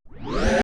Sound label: scratch, Roland MC-909